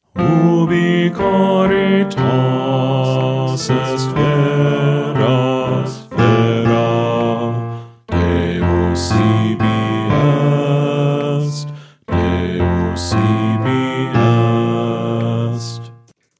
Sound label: Bass